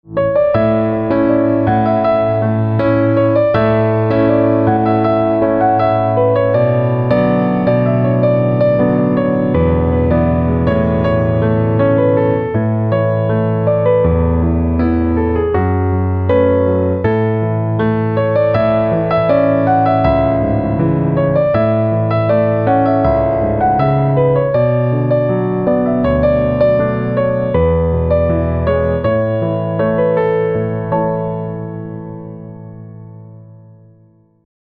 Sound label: Piano Silent